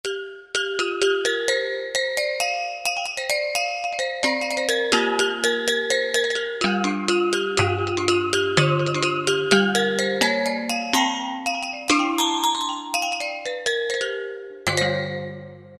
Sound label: Gamelan, Korg T3